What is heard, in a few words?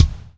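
floor tom, right; Spectrasonics; Burning Grooves CD2; HIT ME KIT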